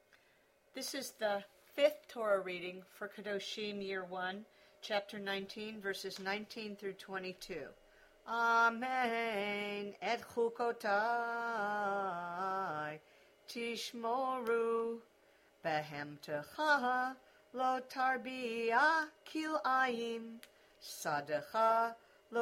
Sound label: Torah